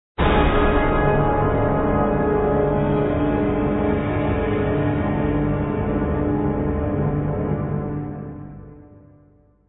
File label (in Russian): orkestr dalekij